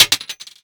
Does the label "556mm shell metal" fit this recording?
Yes